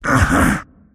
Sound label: move pain; Pudge